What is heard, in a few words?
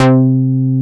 A-ARP, AN BASS; AKAI S-Series CD-ROM Sound Library VOL-7